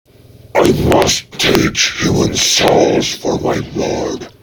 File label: idle, overlord wizard